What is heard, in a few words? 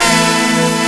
GATE, BODY BEAT